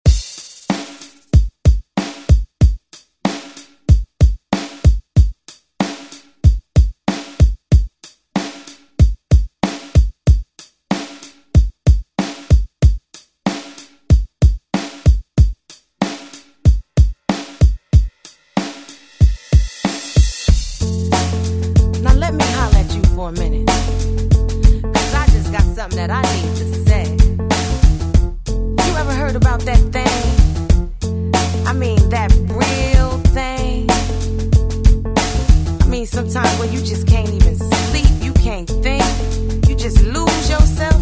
Re Drum; DJ Mhark

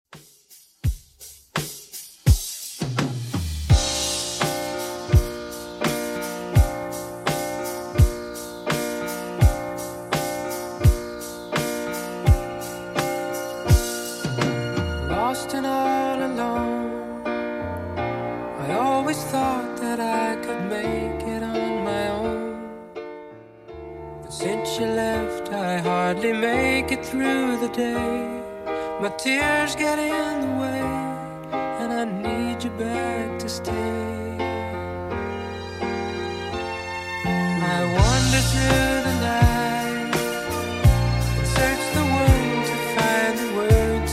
70s Redrum 84bpm

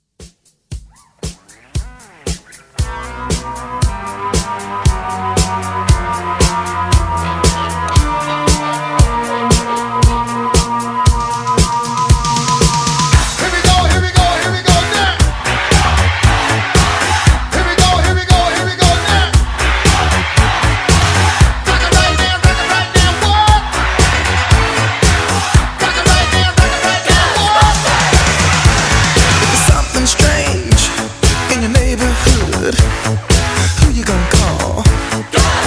Halloween Hype Re Drum CLEAN

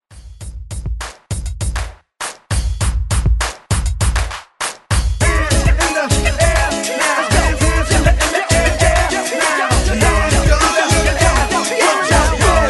90s Hype 100 Bpm; DJDOLLS

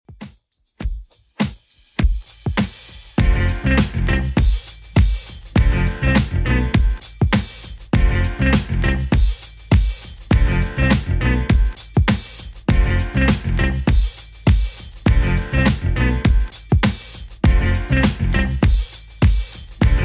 90s Redrum, 101bpm

